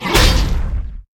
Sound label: Babarian Stump; Inotia 3